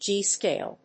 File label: G scale